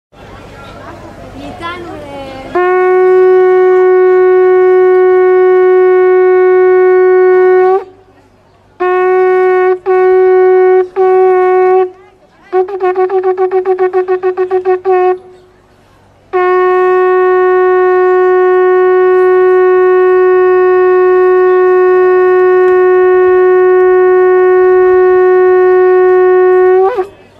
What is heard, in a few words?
Shofar Blowing; Yom Teruah; NVU The Aleph-Tav Project